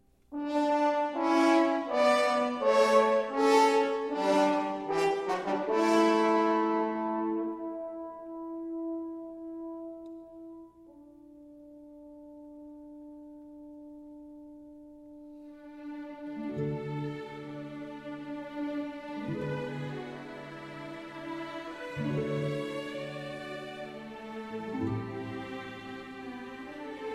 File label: Orchestral